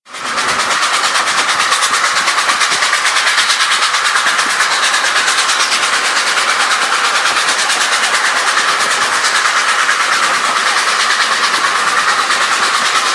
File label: exhaust